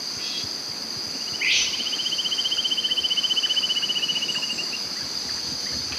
goulds bronze cuckoo

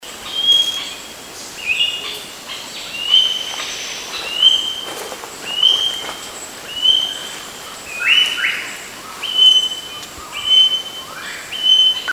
papuan king parrot

